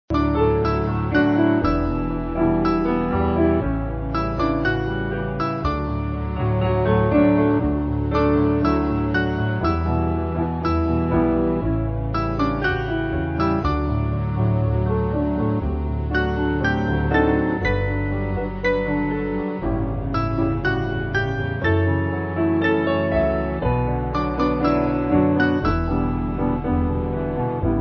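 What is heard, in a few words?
Piano, Music Backing; When We Are Living; Somos Del Senor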